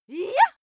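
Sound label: female attack yah, screams; schreie; menschen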